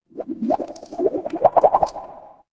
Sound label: water bubble